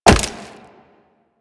Wall Jump Rock; Footsteps; Warframe; Tenno; Wall Run

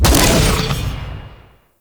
Gun Blade Shot B; Crp Gun Blade

